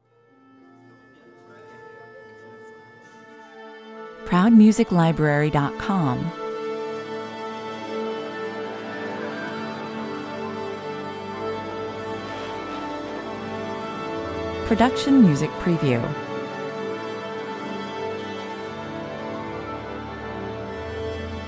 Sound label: fanfare 103 bpm